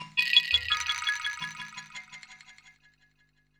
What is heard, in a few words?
Cascade, Sound FX; Zero-G Smoke